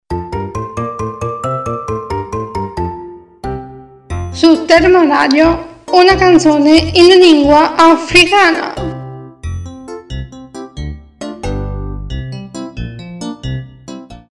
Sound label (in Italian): Canzone in lingua africana